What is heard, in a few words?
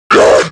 infec pain